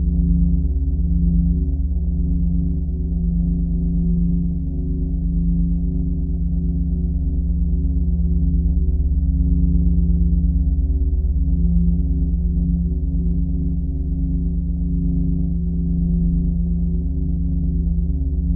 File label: station ambi; Earth and Beyond; EA Games